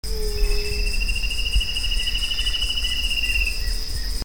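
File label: Hypoedaleus guttatus song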